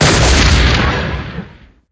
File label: probe droid killed; angry birds star wars